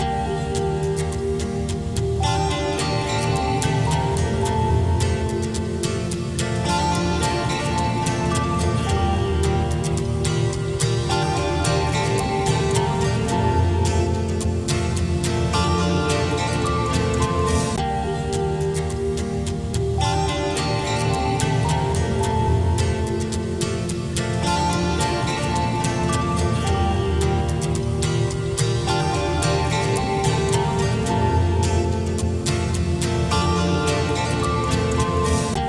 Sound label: guitar loop